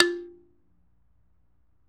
PERC, Dance Mega Drums; 90 s Sample CDs; Best Service

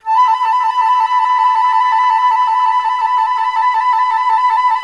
FL TL MI A4; Best Service; Peter Siedlaczek's Advanced Orchestra

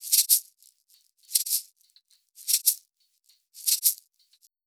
PERCS, Roots Funk; USB Soundscan vol.41; AKAI

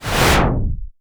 IMP WHOOSH

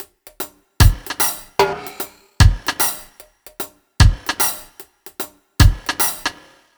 BT, Ragga & Dub Reggae

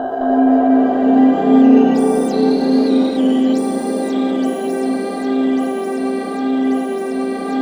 chaos C4, ambient atmospheres

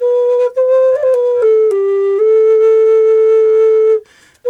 D2 108, Didgeridoo

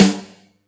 Yamaha EX-5

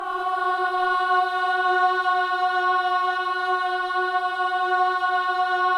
BOYS AHS, Symphony of Voices

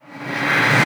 Misc Short FX; Vengeance - Essential Clubsounds Vol.4; Effects

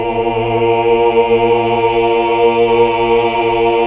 HAL OOH OOOH Oct; Sound & Vision - Gigapack II CD 1 (Roland)

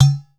udu

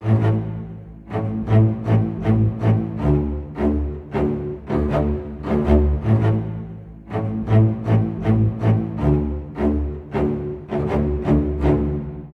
80 A maj min, Low Strings; PowerFX; Downtown Orchestra

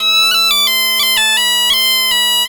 AM BP, Celtic Flavours, BAGPIP085; USB Soundscan vol.42; AKAI

